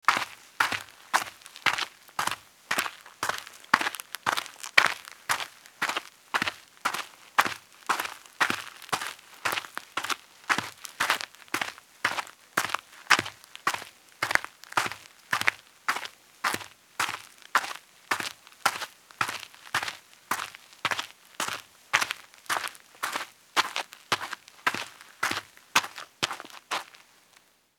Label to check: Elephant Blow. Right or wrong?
Wrong